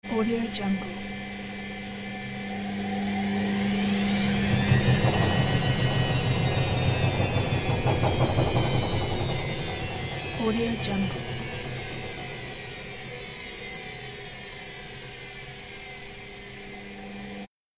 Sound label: washing machine press